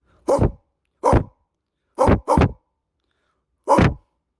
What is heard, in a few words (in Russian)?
gav gav